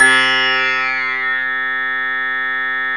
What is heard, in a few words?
BELL, SYNTH FUNK; OBIE BELL; Zero-G - Funky Elements